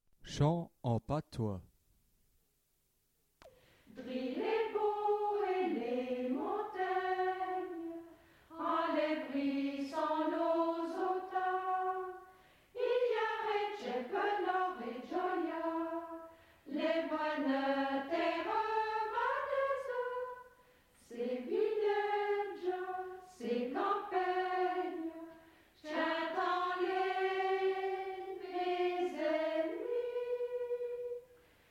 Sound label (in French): Chants Patois